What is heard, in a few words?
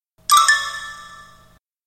phone tone use for email notification